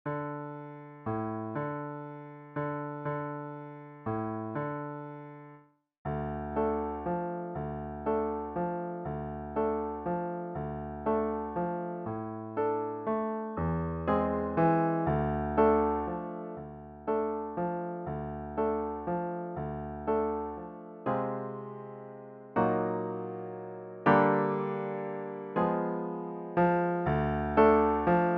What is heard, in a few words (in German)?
Klavier; Klavierbegleitung